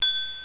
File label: CRYSTAL; 铃声素材